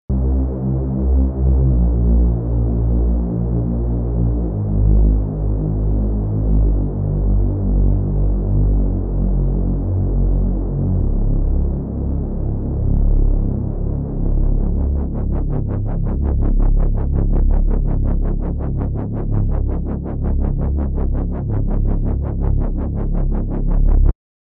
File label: allfunkbass unisono; Minimonsta